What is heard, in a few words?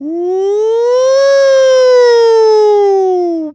Siren; Salvatore